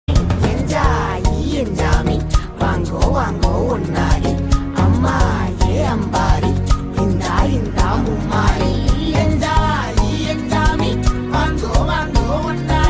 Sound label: mobile ringtone